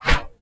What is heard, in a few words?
swing; monster